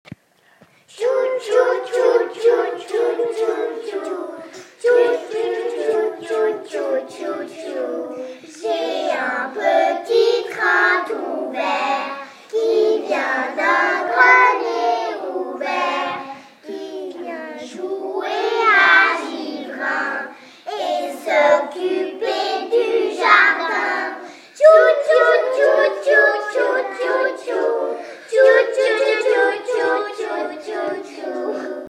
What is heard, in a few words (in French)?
chant